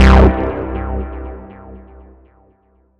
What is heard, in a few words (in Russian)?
ejsid haus v prostranstve vibriruyushij